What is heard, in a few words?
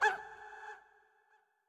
Toxic Mask Hurt